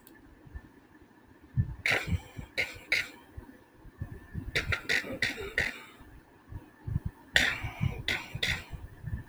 Music Musical instrument Plucked string instrument Guitar Strum, vocal imitation